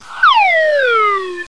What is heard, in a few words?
drop; 3D WORLD TOUR